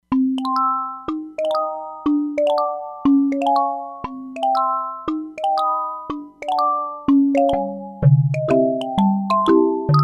Syn Kalimba, E-mu Proteus-3; Factory patches

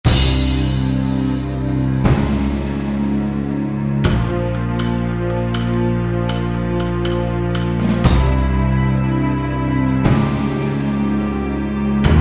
Orchestral, E-mu PK-6

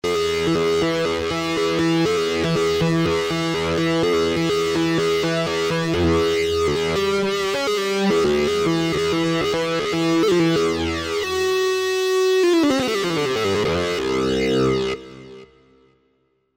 Monster Lead, Korg TR-Rack

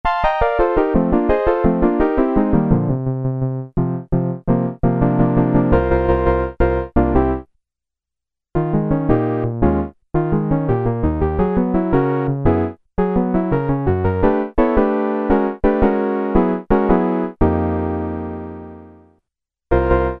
Korg Poly-800 factory sequence

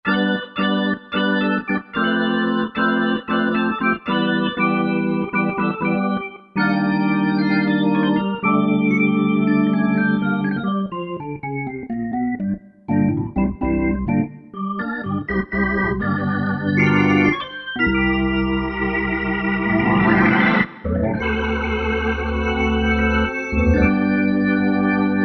B3 Sermon; '60s & '70s; Roland SR-JV80-08

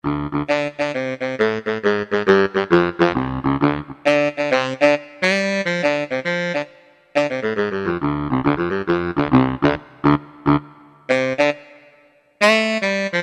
WN Tenor, Yamaha TG77; Factory Presets

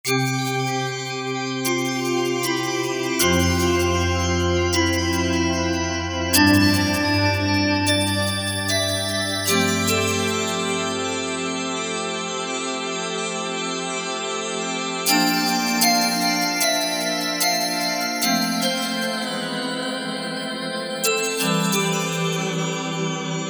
Magicians, Korg MSC-8S Percussion

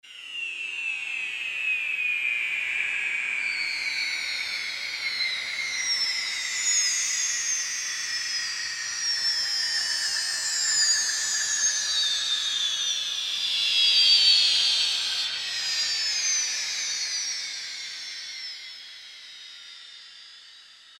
Electro Gulls, Roland JP-8000; Factory Patches

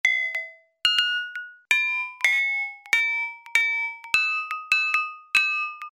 Broken Chime; Alesis airSynth

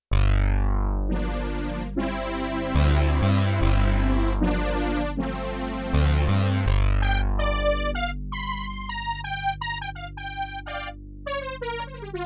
Bass-SynBrass-Split, Yamaha TX16W; Synth Set

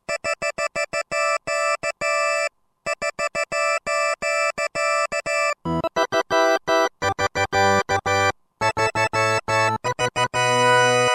Farfi Combo, Roland SR-JV80-08; '60s & '70s

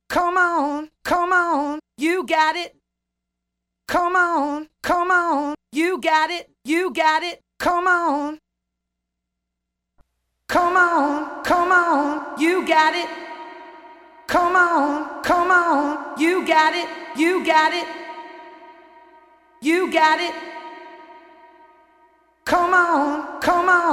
Sml-Med Hall; Preset

